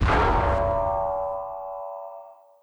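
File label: Rivet Hit Exterior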